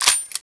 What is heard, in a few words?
slide release, weapons; cstrike